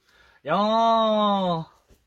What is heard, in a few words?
yeooohhhh